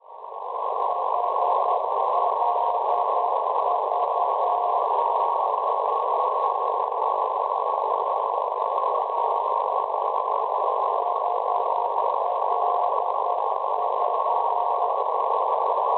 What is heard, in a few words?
NDB Holesov Repubblica Ceca 448 kHz; HLV; Schwarzsee